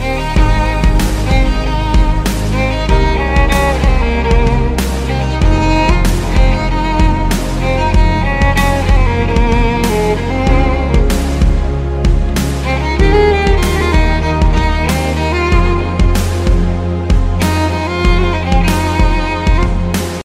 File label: D Sharp Violin